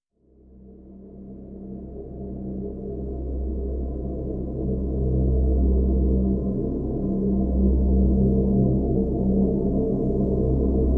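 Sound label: Drn C, drone; Drn River Crossing C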